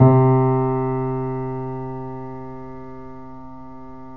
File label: 3D Pianos Yamaha C7; E-MU Producer Series Vol. 5